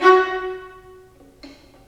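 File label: STR, Roland String Master Series, Violins Marcato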